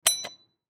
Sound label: Wheelchair Door, Wheelchair Door Open Push Button; Hospital; Emergency